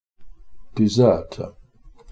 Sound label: desert